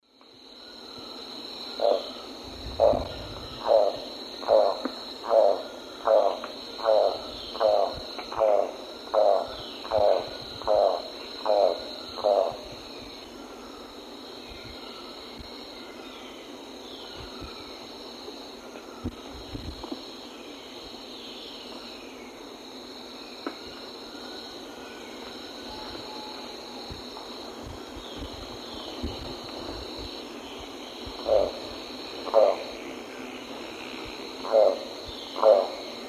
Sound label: Selenidera reinwardtii, song; S. America; Eastern Lowlands